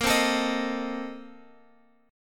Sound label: Bb Major 7th Suspended 2nd Suspended 4th, Guitar, Open F; strummed